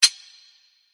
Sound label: Click Failure; the great ottomans strategy battle for throne android